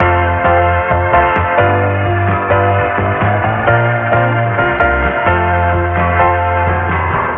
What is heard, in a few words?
piano small town bar, Loops; Phonky